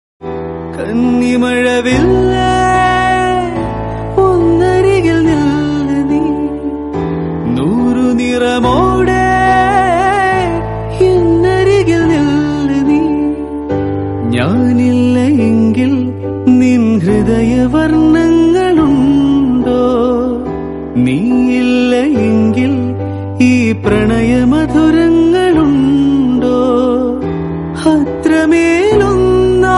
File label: malayalam song; vellithira